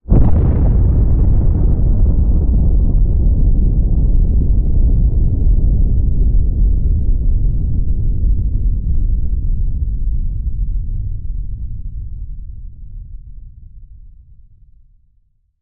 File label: Explosion Crash; War Ambience; Prydwen